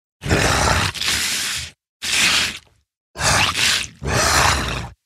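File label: orc drinks water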